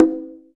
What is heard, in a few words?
Lo Conga; Roland BK-5; Percussion